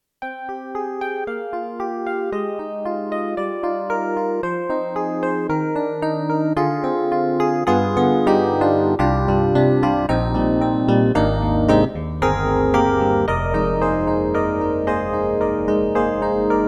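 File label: YAMAHA MU1000EX Soft DX, piano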